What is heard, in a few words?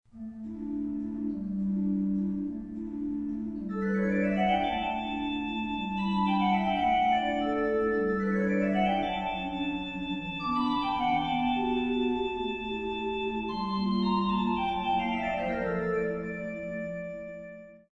Caprice; Archer